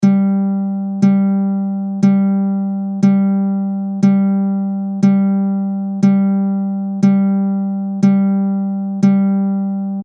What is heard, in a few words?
GCEA G Low